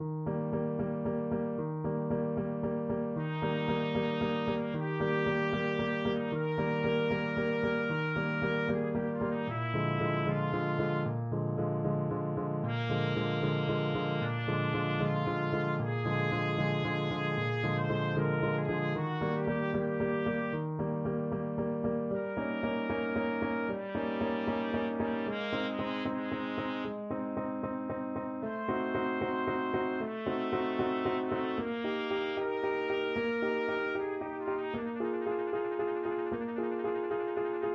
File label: concerto, trumpet; mozart concerto21